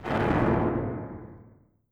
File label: explosion; sound effects and sound reinforcement; The Passion of Dracula